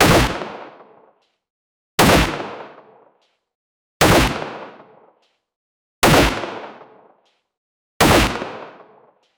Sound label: heavy clear gunshots